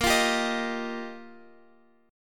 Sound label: G Minor 7th Sharp 5th, A sharp